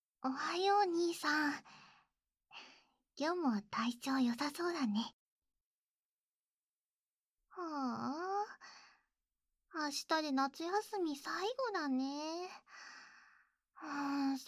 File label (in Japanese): 耳舐め, ASMR